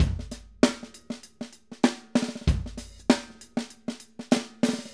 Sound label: Acoustic Live Drum